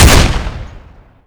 new ar22; garrysmod

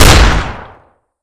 chinook; garrysmod; weapons

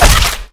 chainsaw gore; garrysmod

